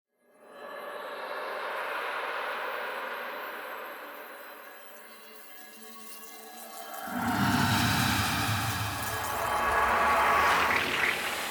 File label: Absorb; Warframe; Sacrifice; Sacrifice Vitruvian Sequence; Cinematics